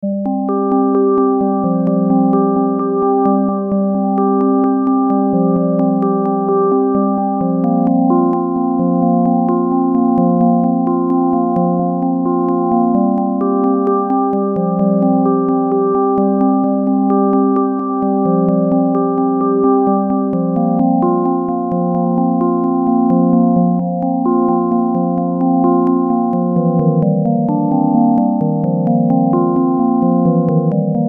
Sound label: OP-X E-Piano